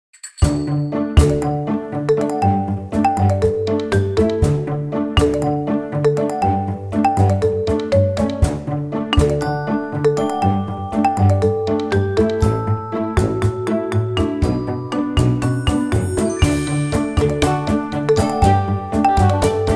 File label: Cute Talk